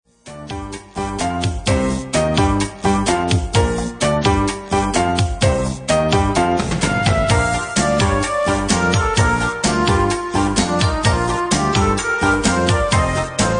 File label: mexican hat dance